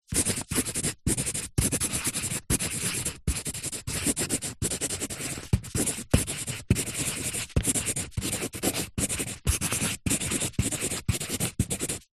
office pencil artists thick writing on paper

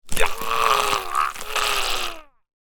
horror strangulation break neck male scream; sound effects one

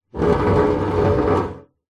stool chair metal scrape drag wood deck; household

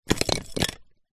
wood pieces handful small light thin set down; foley; zapsplat

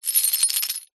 metal small chain drag concrete ground; foley

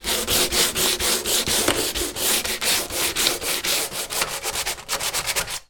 industrial sanding wooden door sand paper; zapsplat

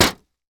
impact glass window thin; sound effects one

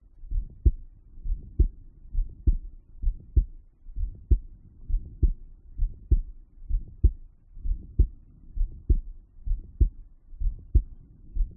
heartbeat heart murmur at tricuspid

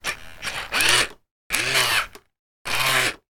science fiction robot movements; sound effects one

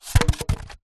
polystyrene piece drop ground; foley; zapsplat

